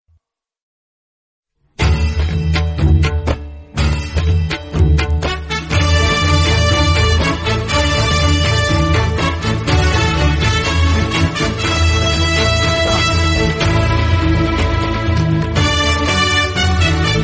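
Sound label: Intro background music Telugu Ringtone; Young Yama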